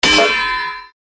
metal clang

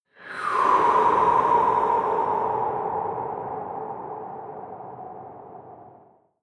air breath release

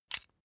puncher release

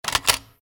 door lock

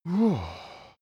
cartoon male relief close call

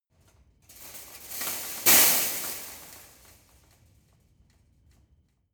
land metal fence

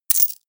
medieval collect coins light

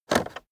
car temperature knob turn short fast

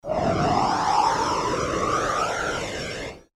airy smooth phase

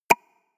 pop

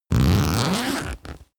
zipper big slow

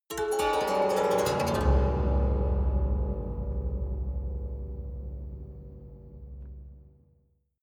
haunted strings down stroke fast soft

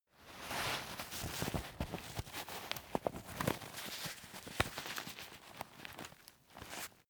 umbrella telescopic close velcro

